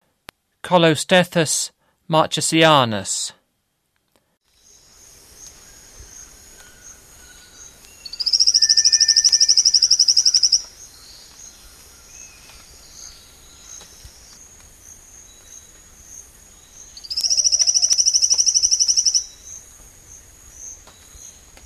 Colostethus marchesianus, Frogs of Ecuadorian Amazon; S. America